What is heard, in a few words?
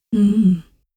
hmm; dialogs